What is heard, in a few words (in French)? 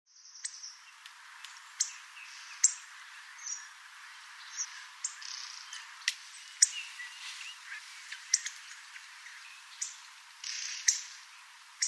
Grosbec, chants oiseaux